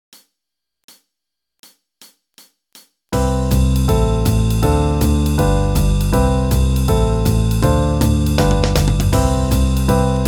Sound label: Backing Track C major 160 bpm